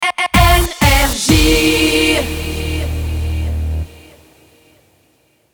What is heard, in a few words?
Jingle Rapid Fire; NRJ